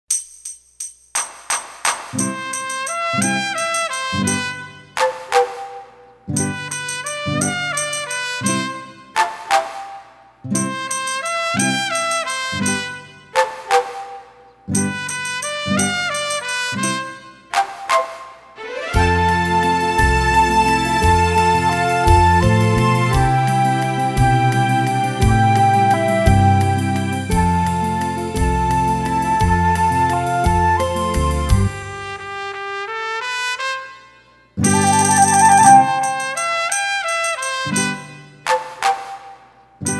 Mexican Clapping Song

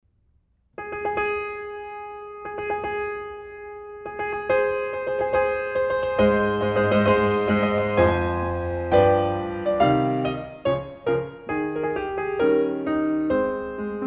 Wedding March

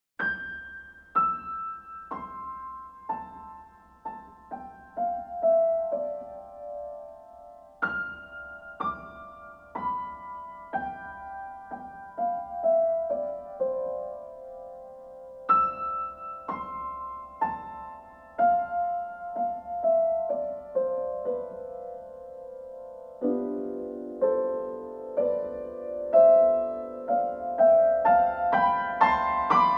piano

